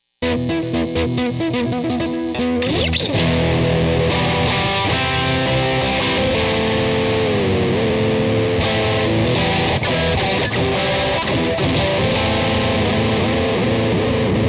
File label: electric